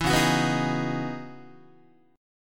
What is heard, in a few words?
C sharp Minor 11th, Guitar; C#m11; strummed; JGuitar